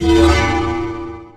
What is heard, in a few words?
Menu Magic; Menu FX